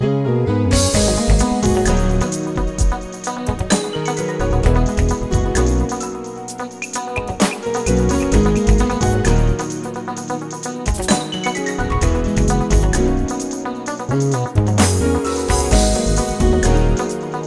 silly midi demo slow down with vsc and xg together